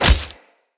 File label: Unarmed Tempest Punch Impact Default